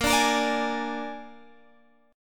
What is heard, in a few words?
Bb 7th, Guitar, Open G; 20,19,20,19,21,x